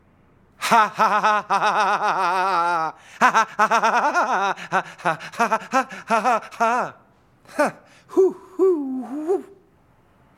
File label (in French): rire